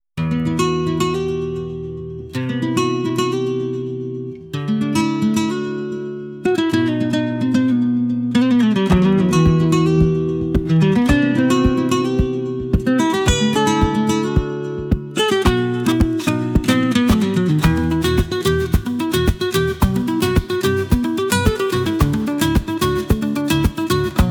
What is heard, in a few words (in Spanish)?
Serenatas callejeras, flaming strings